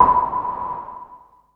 EFX, SFX KIT; Wizoo - Platinum 24 Electronic

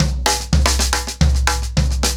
110BPM, SNARE TOM SOLOS; Sonokinetic - The Roots Drumloops; ACOUSTIC DRUMS

